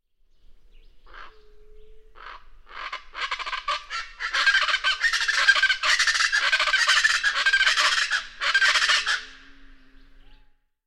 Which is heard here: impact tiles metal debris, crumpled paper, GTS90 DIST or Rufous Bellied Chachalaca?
Rufous Bellied Chachalaca